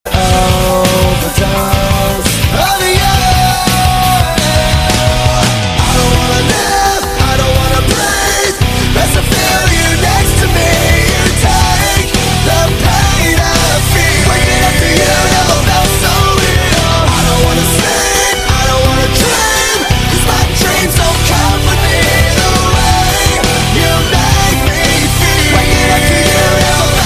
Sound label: sms ringtone